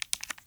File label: Mouse Click; Custom UI Sounds; Resident Evil 2 (2019)